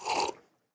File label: drink